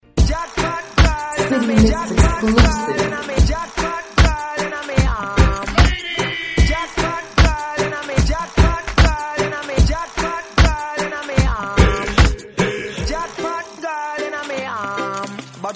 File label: reggae (With Drum Intro)